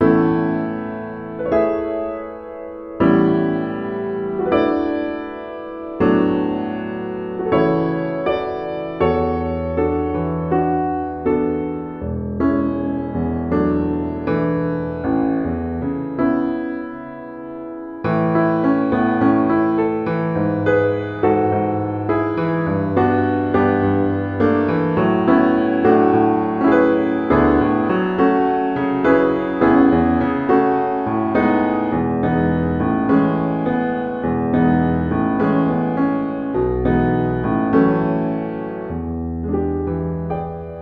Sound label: advanced piano solo